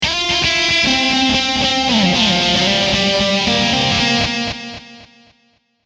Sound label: echo